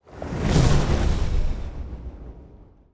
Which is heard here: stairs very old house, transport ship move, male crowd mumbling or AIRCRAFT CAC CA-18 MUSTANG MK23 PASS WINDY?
transport ship move